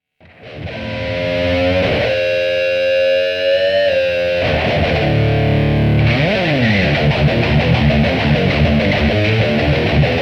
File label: Custom High Gain